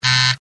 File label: fail